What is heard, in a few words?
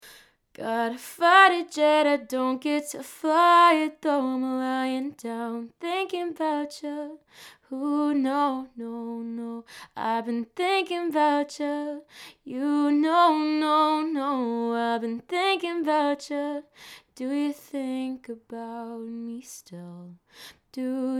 singing; JLab Talk PRO cardioid